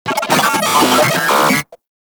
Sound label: Vocal Pain; Moa; Vocal Set D